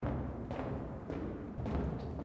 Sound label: footsteps metal; Code7